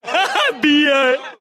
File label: haha; bier